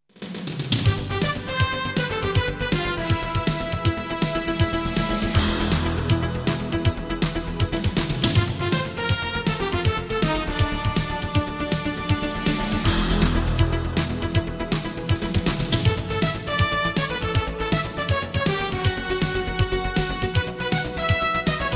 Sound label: Jingles; 90 DDD Jingles